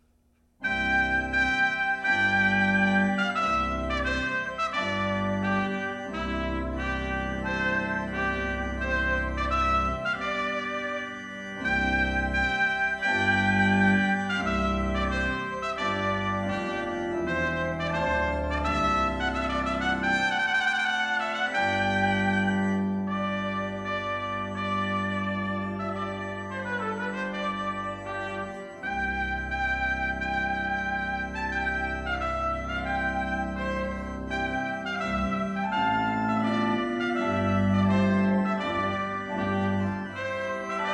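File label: Trumpet Tune with Organ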